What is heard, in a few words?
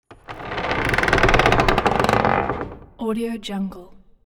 debris wood wood grind large; smartsoundfx